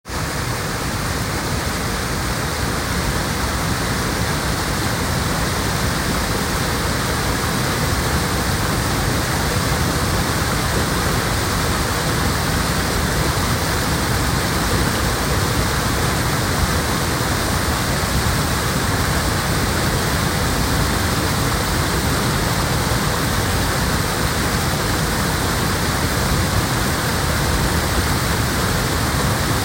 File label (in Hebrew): מפל